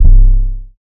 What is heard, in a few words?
808 soft C, Tonal; Hip-Hop; Slate; CAICOS Hip-Hop Sample Pack